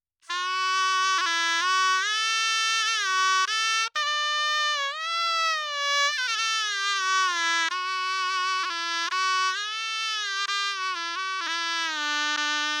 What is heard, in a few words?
shenai 4-4 D 128 dry; Melody; Sample Banks